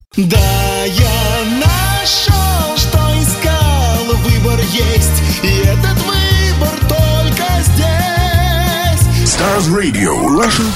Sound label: VOKAL